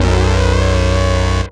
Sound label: Common low; instrumente